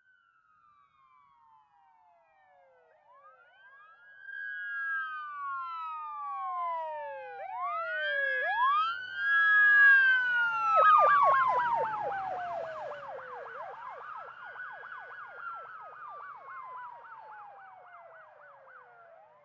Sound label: Ambulance By Fast